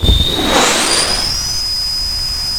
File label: vehicle turbo loop; hl2